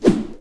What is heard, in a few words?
swoosh; CJBMods; Minecraft